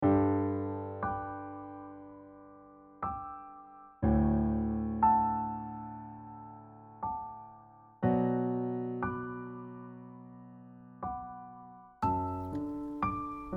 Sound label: In time of Silver Rain Soprano Dominant